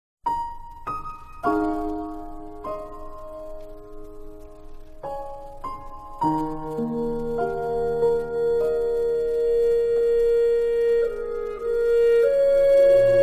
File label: Instrumental